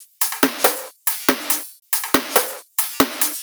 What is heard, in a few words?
drum Loop 140BPM no kick; Vengeance - Essential Clubsounds Vol.5; VEC5 Drumloops; VEC5 Multi Loops 140BPM